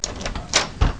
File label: door op